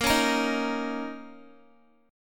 A sharp Minor Double Flat 5th, A sharp, down, Guitar; A#mbb5 strummed